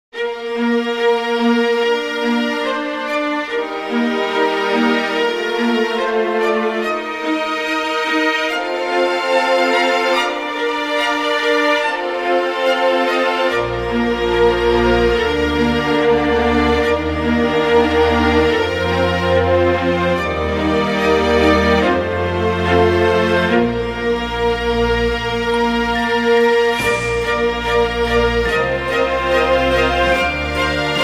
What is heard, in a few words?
Happy Orchestral